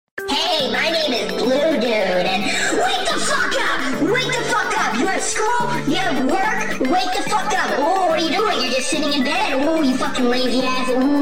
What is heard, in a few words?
alarm